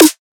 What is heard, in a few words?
snare; osu